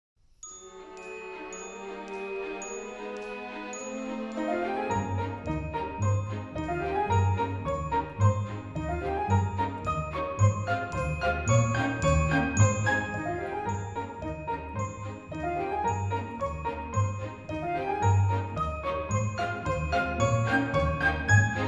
Turns, exam music

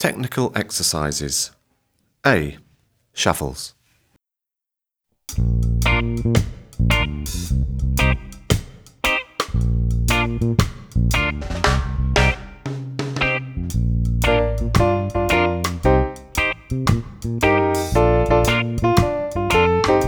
Shuffles

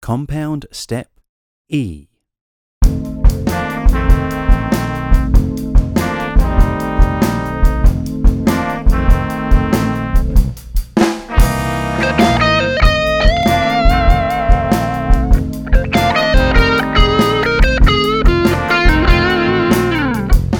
Blues

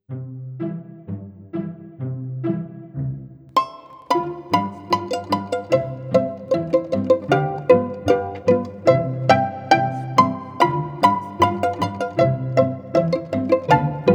Arms And Head, Pizzicato Polka

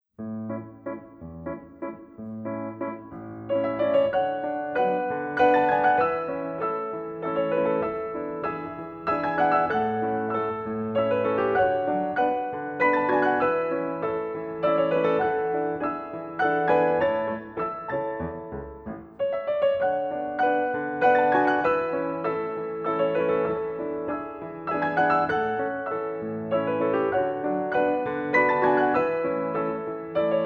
Sentimental Waltz

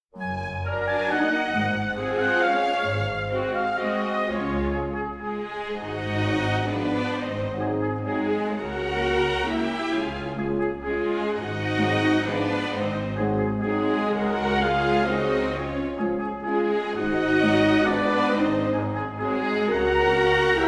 Port De Bras, Grand Valse

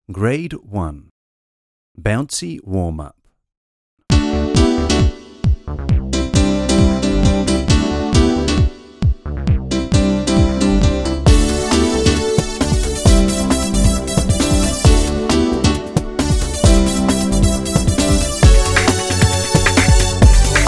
Bouncy Warm Up

